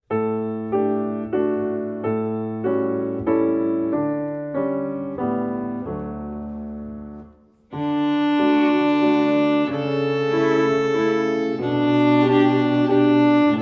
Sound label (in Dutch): Waltz vioolensemble